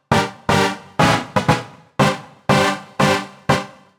Progressive Synth, Trance; Sonic Foundry (Sony Creative Software); Trance NRG VOL-2; Progressive Synths

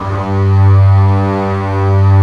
BASS G1, VIOLINS

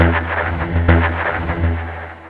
Ugly, Industrial, Nasty

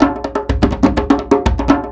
Percussion Loops, Bongo & Conga; Houseworx

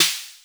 Miami Snare

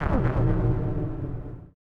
AMBI FX

